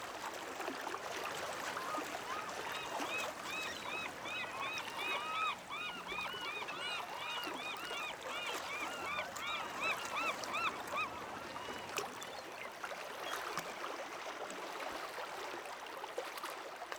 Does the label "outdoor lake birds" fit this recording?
Yes